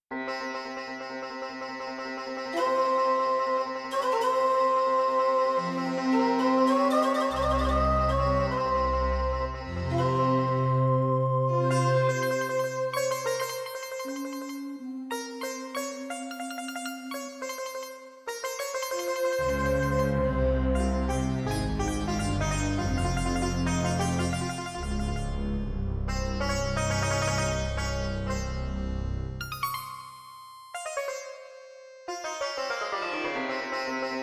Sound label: Intro, Roland JV-1080; Prince of Persia 2 - Intro